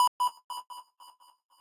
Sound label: lead